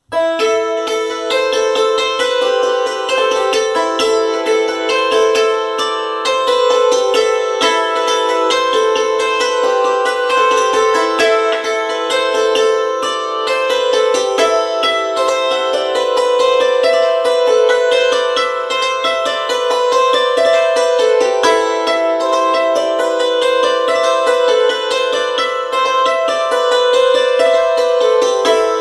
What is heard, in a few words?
Ievans Polka or Finnish Polka